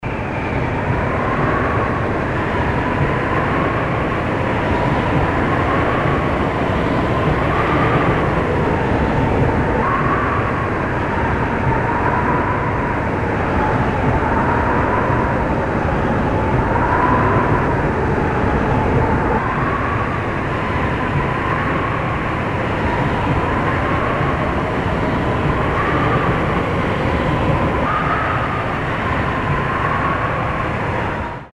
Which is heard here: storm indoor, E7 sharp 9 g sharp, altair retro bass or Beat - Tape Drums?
storm indoor